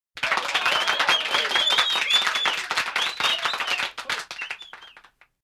applause; sven; sharktopia

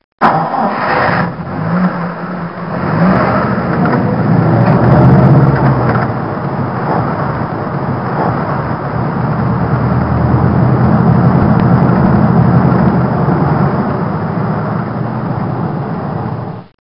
jeep start

